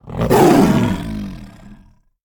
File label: Tiger Figurine Attack